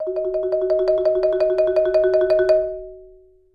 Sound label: Marimba roll B3; Sampleheads - Dave Samuels Marimba & Vibes